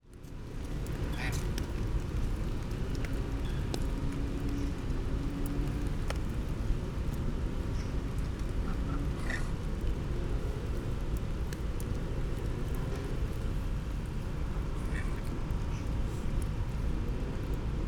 DPA Close goose vocalisations crow calls rain people passing